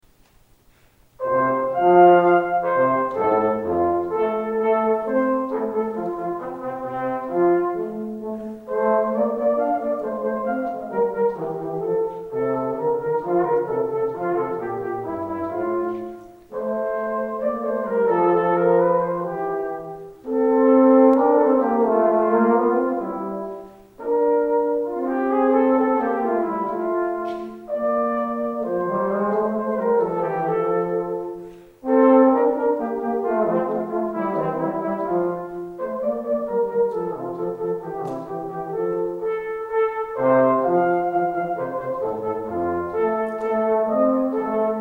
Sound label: Table top duet